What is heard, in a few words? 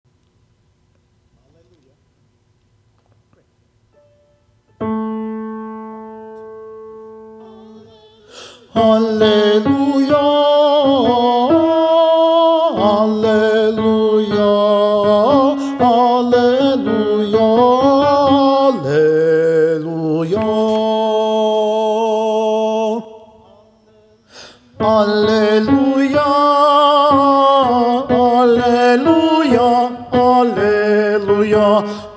alleluia alto